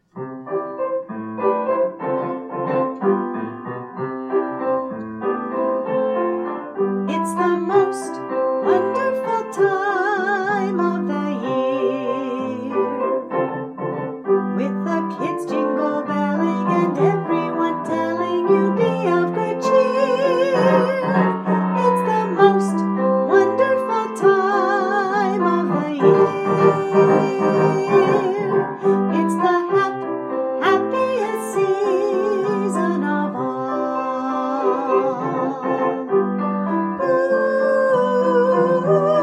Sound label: with Vocals